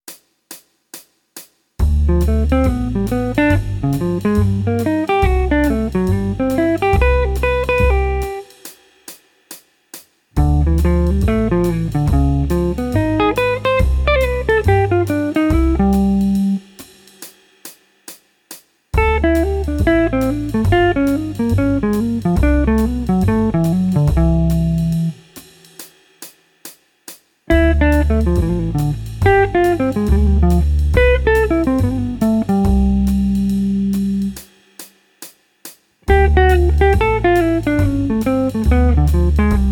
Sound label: Jazz, Guitar; Guitar Techniques 2019 Issue 294 Spring